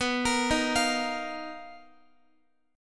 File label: B Major Sharp 11th, Guitar, 4F,4A,5C,5G,5C,6E; arpeggiated